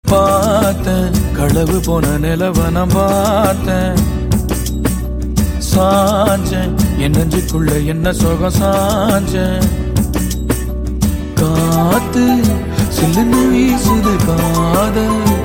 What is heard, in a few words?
Male Version Ringtone